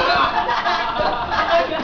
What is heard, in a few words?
laughter